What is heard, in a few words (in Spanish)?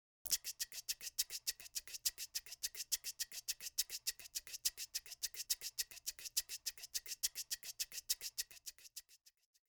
Maracas